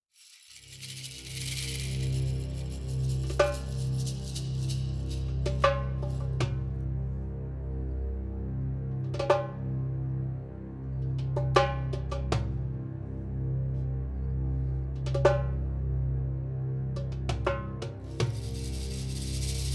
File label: Mediterranean Waves Percussion Only